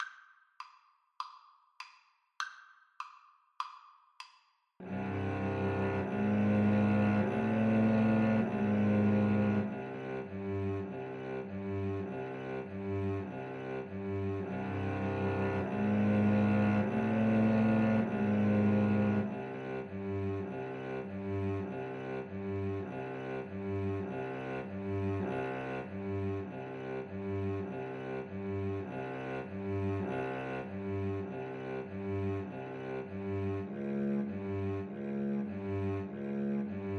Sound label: Cello Duet